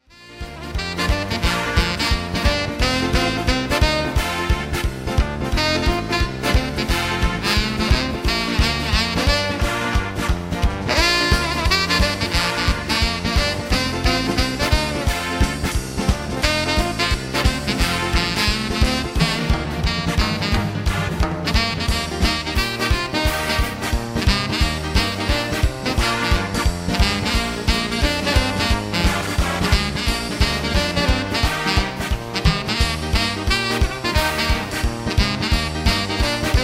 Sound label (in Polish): saksofon